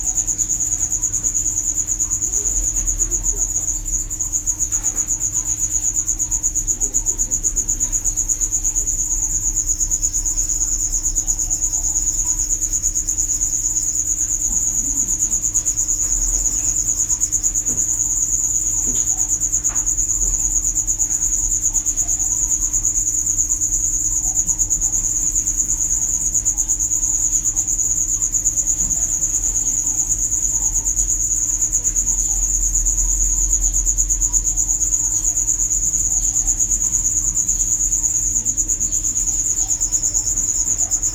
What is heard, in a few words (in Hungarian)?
takarmányozás céljából tenyésztett, banántücsök; jászberényi zoo 2015